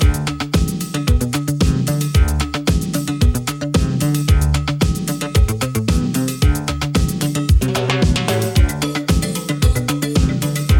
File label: Instrumental, synth-pop